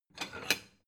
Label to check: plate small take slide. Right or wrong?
Right